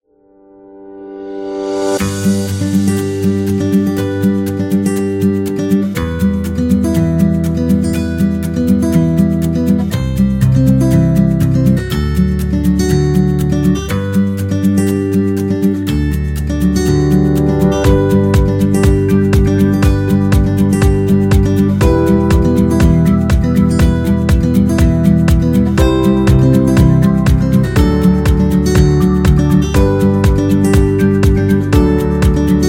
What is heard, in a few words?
Guitar Soft, happy music